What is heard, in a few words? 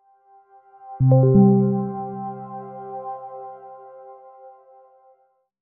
startup; win12